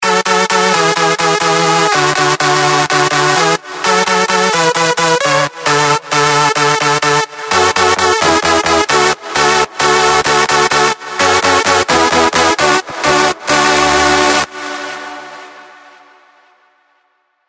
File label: CLASSIC LEAD